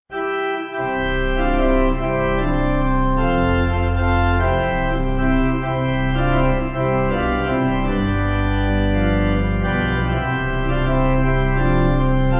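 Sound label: Pipe, pipe organ; A Wonderful Saviour; Hear the footsteps of Jesus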